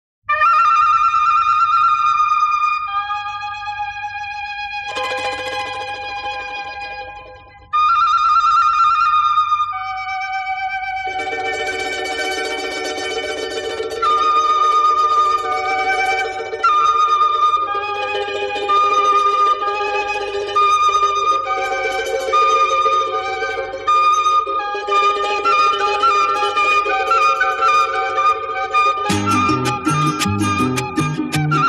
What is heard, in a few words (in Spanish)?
pajaro campana